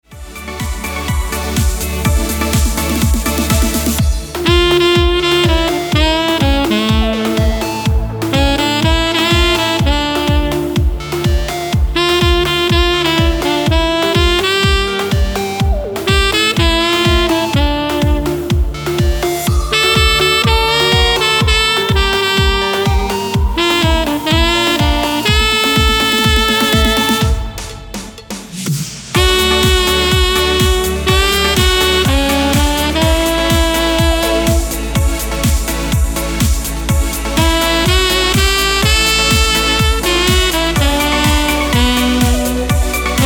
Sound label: Schlager, sax